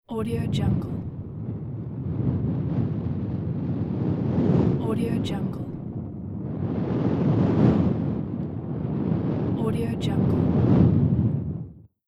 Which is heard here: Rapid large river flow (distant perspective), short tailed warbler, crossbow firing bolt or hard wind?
hard wind